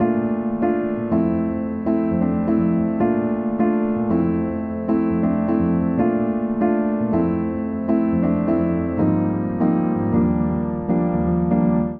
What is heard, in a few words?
Progression IV-I-biii-bvii F Major 80bpm, Upright Piano; MIDI Clips; Hazey Stroll; Ableton; Factory Packs; Spitfire Audio